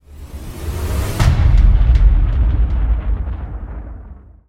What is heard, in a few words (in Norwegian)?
dramatisk lyd